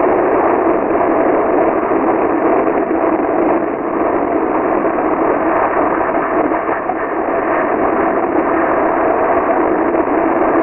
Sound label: tornado